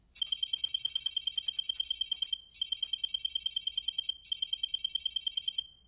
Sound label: matrix typing; mainframe